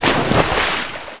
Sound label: splash; Singles Flirt Up Your Life